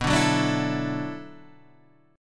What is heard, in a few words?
B Minor 11th B down